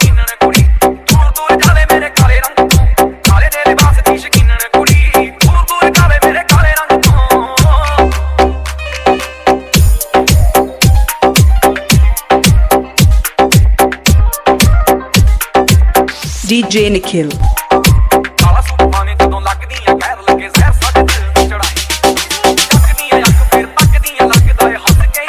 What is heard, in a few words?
song ringtone